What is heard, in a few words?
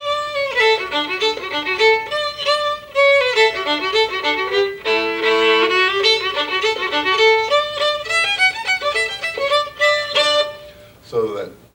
Rocky Mountain Goat aka New Rigged Ship Hornpipe, musicbox